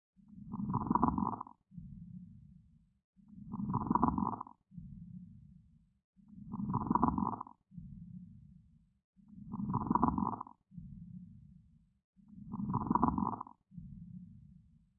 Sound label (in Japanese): 副雑音 捻髪音